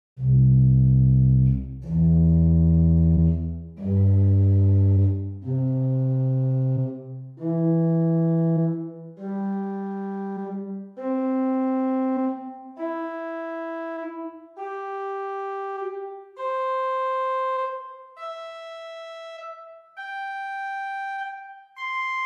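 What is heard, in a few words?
Great Open Diapason Large 8 arpeggio, organ stops; St Annes Moseley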